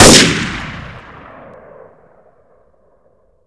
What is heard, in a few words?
scout fire, weapons; cstrike